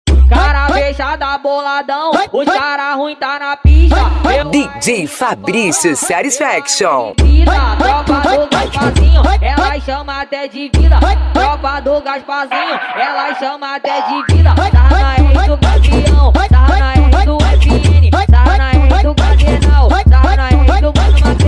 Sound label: funk mega bass